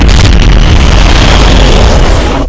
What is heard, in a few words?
Kick Sound